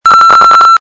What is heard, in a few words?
signal